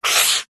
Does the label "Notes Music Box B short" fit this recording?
No